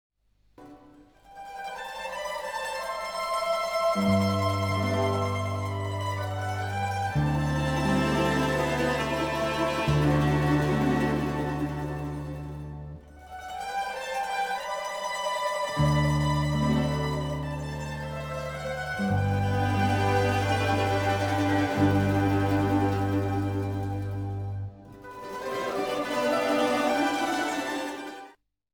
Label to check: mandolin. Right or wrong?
Right